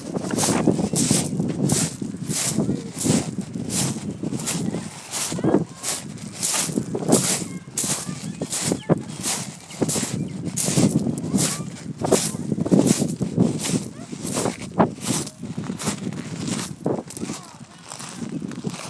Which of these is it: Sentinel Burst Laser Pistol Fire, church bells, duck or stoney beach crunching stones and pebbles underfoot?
stoney beach crunching stones and pebbles underfoot